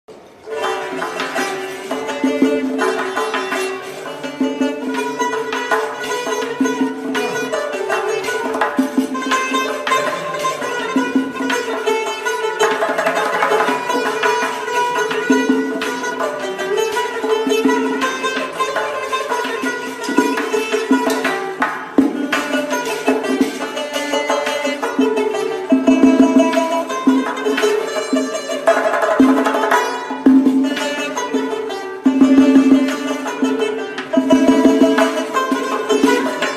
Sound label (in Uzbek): jingle bells musiqasi; ozbekcha talqinda